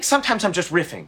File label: riff